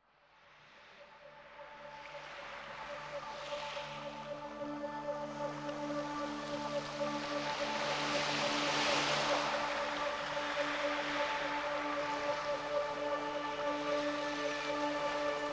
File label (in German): Sirene